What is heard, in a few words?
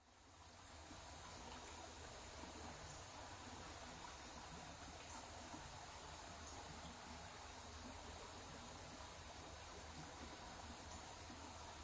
ambient